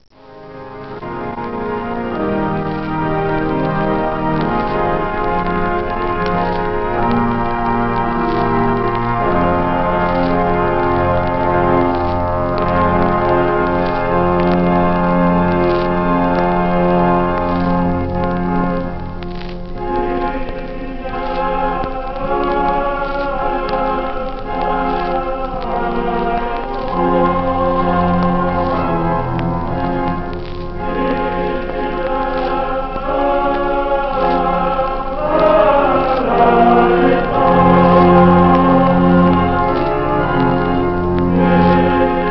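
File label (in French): début du Kyrie de la messe pour deux orgues; orgue cath oran; de Charles-Marie Widor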